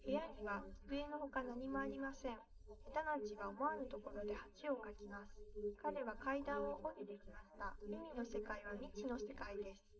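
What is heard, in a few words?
female, live recording 130ms 1m; SiSEC11; underdetermined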